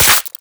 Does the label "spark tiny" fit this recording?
Yes